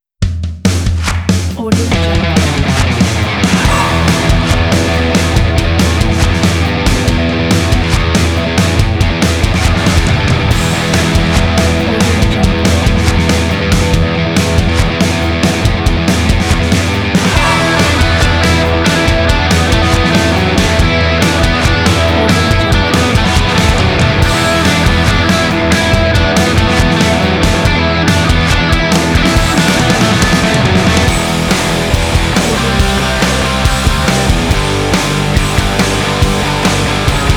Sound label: super powerful action and sports rock